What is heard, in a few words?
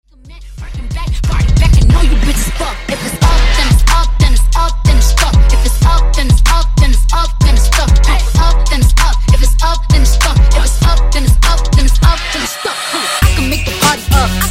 Funk Wordplay Shortmix Set 91 101Bpm